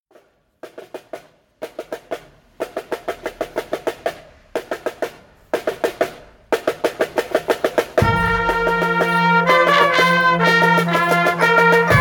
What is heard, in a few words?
highland